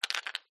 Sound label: batteries aa handle click rattle; skyclad sound